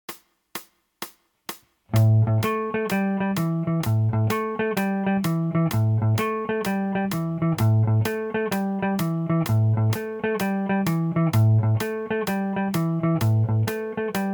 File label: blues, 12 bar blues